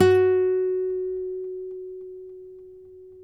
NYL F#, FLAMENCO NYL; Spectrasonics; Hans Zimmer Guitars VOL-2

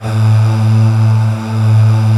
A1 MALE AH; Northstar - New Gold VOL-2

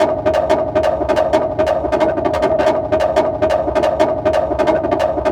Dope Break, Drum & Bass; Fluid Dynamics

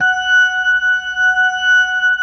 F#5S, B3, Vintage Keyboards

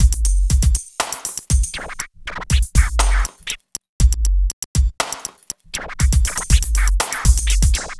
Beat

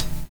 DISCO HH, DISCOKIT; USB Soundscan vol.11 - Drums Dance & Groove; AKAI

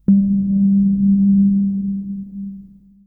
Synth hits and riffs

